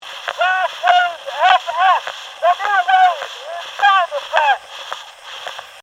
Foil Voice, Recorder; Technology; Blastwave, Fx; Miscellaneous Electronic Sound Effects

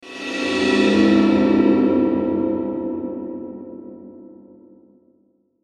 Sweeper Metallic Ring Dark; Production Element Imaging Element Accent Transition; Sweeper Sound Effects

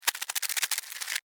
Beetle, Scurry, Run, Stop, Insect, Bug; Animals:Insects; Blastwave

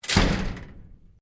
LOCKER METAL OLD DOOR SLAM; Old metal lockers

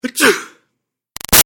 Human Male Sneeze; Sneezing; Sound Effects:Human; Big Room Sound

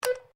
MORSE CODE E; Morse code aplphabete; Morse Code (Sound Effects:Electronics)

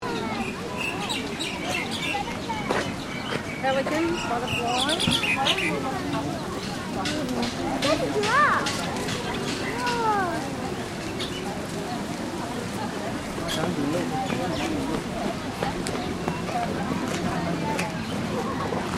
Ambience Melbourne Zoo People Birds Loop; Zoos

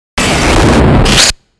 fast attack; Fast Attack Warp Blip; Warp Glitch Shine Production Element Imaging Element Accent Transition

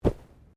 Bodymovement Fall Light

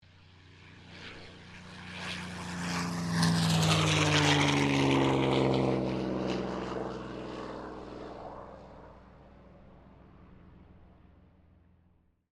AIRCRAFT CA-18 MUSTANG TAKEOFF; P51 /CAC-18 Mustang; Airplane Sound Effects; Airplanes (Sound Effects:Vehicles)

